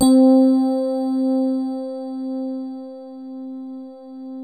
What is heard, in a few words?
DX Rhodes; Best Service Advanced Media Trax Modern Composer